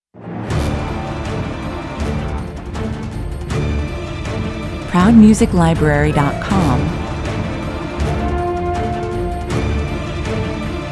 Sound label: no choir